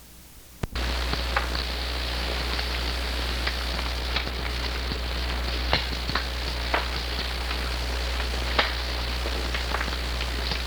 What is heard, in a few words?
message tape wild